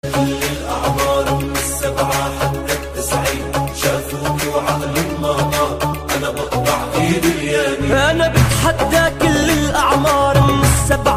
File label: emotional arabic ringtone